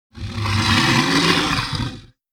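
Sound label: beast move, monster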